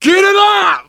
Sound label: scream while pounced, biker; player; survivor; voice